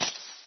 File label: creeper